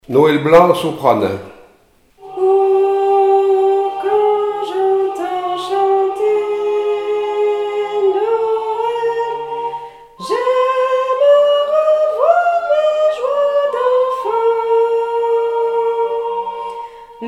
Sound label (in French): voix soprano